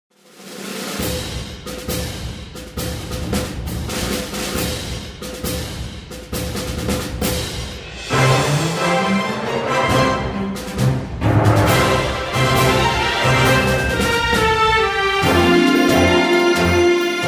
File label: Battle BGM